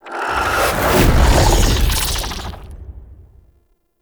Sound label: Polymorph Spider Cast; Enemy; Abilities; Characters; Mendicant; Mnd Wazard